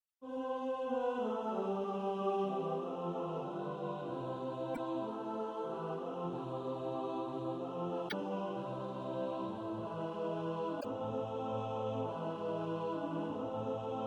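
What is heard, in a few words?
alto; How great our joy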